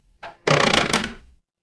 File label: door creak